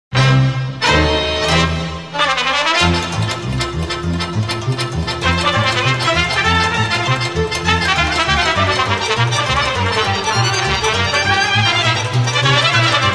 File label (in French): Sirba - Roumanie